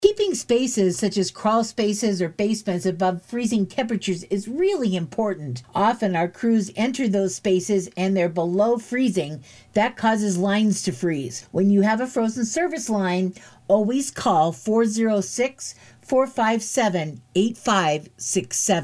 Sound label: Pipes